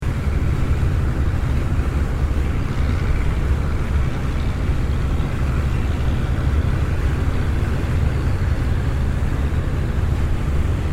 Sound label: cargo boat, laos